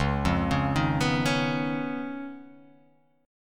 C sharp 9th C sharp down, chord

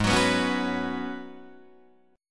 G sharp Minor 11th G sharp Guitar Drop D, chord

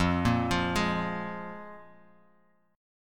F Minor Double Flat 5th, F, Bass Standard; Fmbb5 arpeggiated; 13,13,15,13

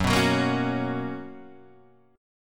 B Major 7th Suspended 2nd F sharp

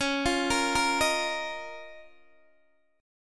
Db 6th Add 9th, Guitar, Open D

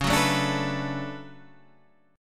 D Major 13th Db, chord

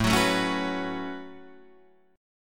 A 6th Add 9th A down Guitar Open D

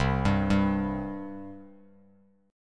C 5th C down Bass Standard; C5 arpeggiated

